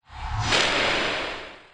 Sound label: arc sfx; ui; stingers; qop